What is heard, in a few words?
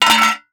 Metal Lid